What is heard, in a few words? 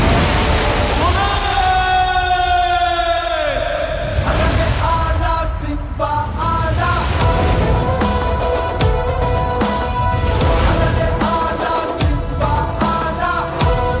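Movie background music Ringtone; Simmba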